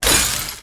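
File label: shield drill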